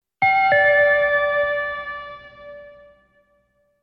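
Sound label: signal before an announcement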